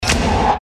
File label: short industrial event